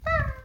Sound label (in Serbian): crvić umire